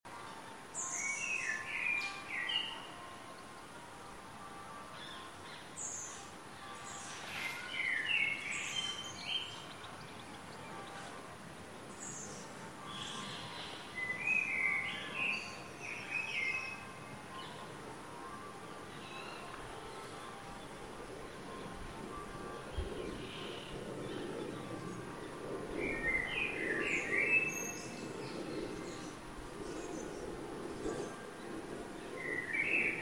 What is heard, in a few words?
Jubilee church bells and garden birds on Sunday